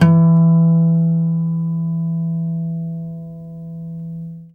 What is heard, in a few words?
NYLON HARMO